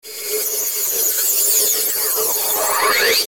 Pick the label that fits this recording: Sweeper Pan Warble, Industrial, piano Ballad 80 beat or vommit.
Sweeper Pan Warble